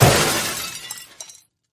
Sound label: Metal Glass, mixed